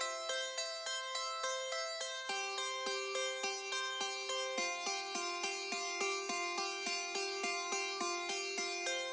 Harpsichord Loop

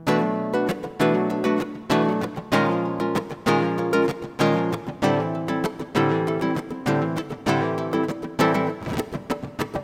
Guitar Chords Riff

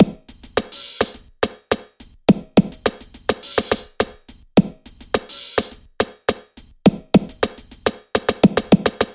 Hip Hop Crisp

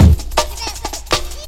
Jungle Classic

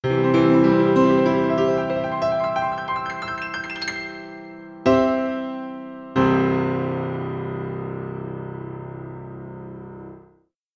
Piano Flourish

